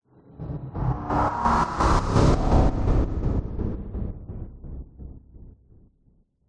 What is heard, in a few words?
sound design sweep chopper stutter slow dark chorus